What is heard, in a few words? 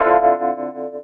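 keyboard, Roots Funk; USB Soundscan vol.41; AKAI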